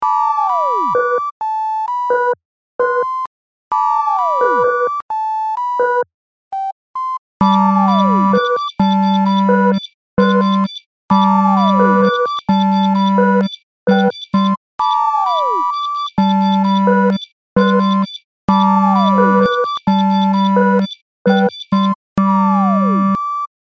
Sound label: Sinewave project